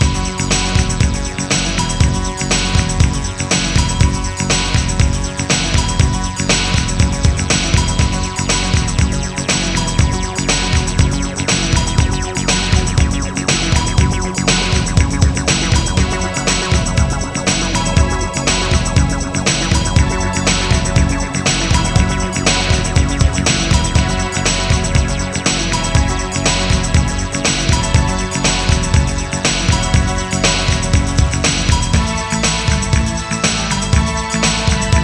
Groove; MusicScapes Professional; Wayzata Technology

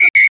STAB

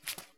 step hero; Geschicklichkeit; Dino and Aliens

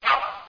vine swoosh

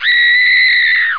bump

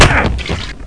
Medium Impact

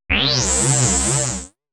icky, Synth

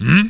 MMMM

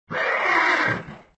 creature Death; fratboy

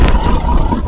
Boss Noise; BOOM

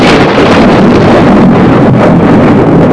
Sound LOUD THU; Spanish Net

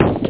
shoot; dosgames; tankfor2

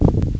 STOMP; Tetris Collection for Windows 98

